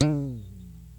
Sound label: Plucked